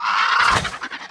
Enchanted Raven Play

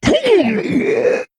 male tauren, Death

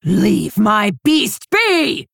Female Orc Attack

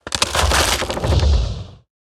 Ancient Totem Death

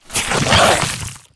Dragon Egg Attack

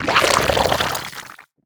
Slime Death

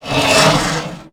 Attack

